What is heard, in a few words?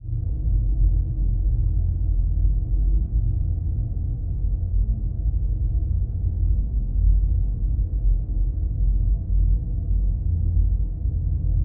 Ambience SciFi Hum